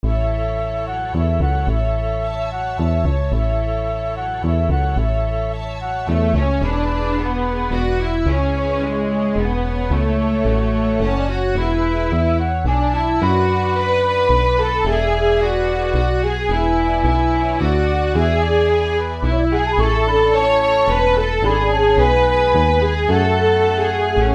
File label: Light Classical